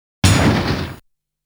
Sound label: Bouncing Crash Bullet Sound; Saint Dragon